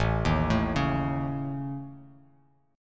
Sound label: A Major Flat 5th; arpeggiated